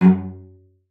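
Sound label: CELLO spiccato F#5; Xsample VOL-5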